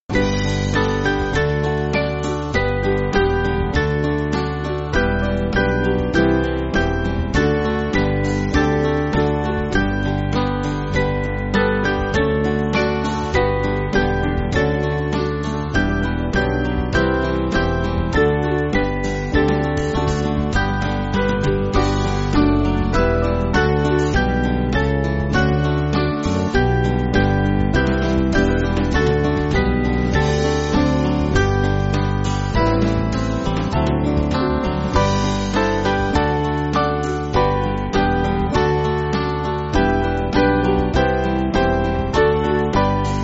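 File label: Band, Free Music Backing Files for Churches; Book Of Books; Liebster Jesus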